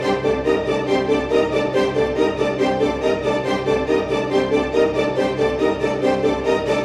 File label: multiplier Beat